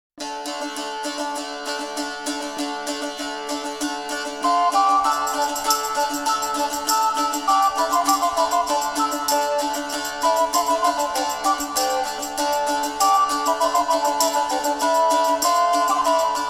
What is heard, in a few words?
djembe saltarello; medieval